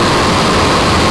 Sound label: sarge flame fire; Army Men TiS